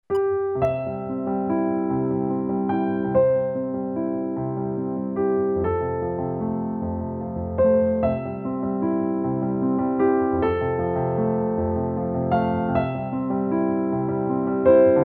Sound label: piano